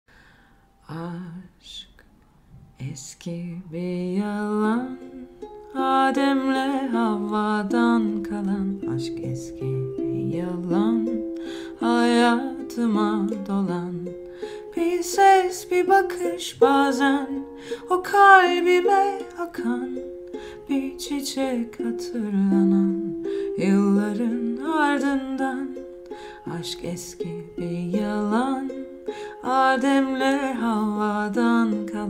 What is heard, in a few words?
ukulele cover